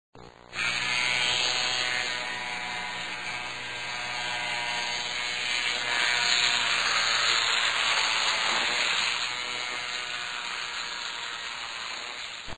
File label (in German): Rasierer; Haushalt